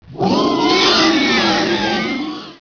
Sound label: Death Scream; Bunny Killer III